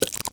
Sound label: Menu Escape; LEGO Marvel Super Heroes